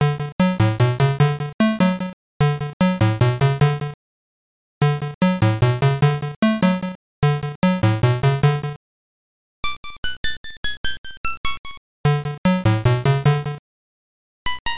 Haunted House; super mario land 2 six golden coins

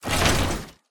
triple Chest Shutter; ROR2

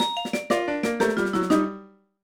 Mini Game Draw; new super mario brothers

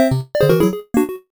Card Game Draw; new super mario brothers

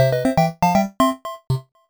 Mini-Game Round Clear; new super mario brothers

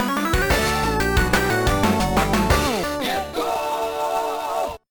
1-UP; Sonic & Knuckles Alternate

